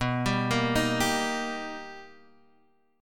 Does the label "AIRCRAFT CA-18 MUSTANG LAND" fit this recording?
No